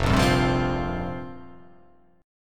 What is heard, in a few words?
F Suspended 2nd Suspended 4th F down